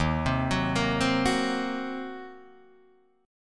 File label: Eb Minor 6th Eb down Guitar DADGAD; arpeggiated